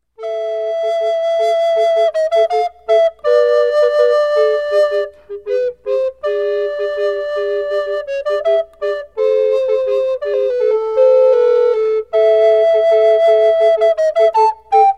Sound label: Tango